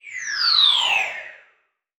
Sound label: Multimedia Internet CD-Rom Flash Whoosh